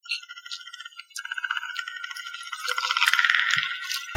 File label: pred click; cstrike